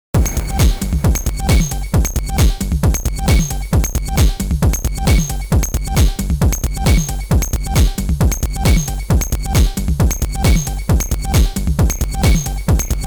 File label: loop mix